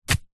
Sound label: wood steak sharp post drive by hand into dirt through thick grass; foley